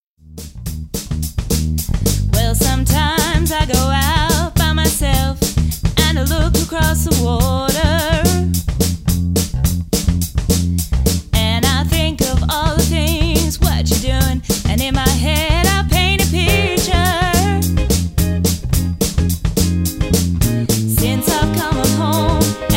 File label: Male Vocals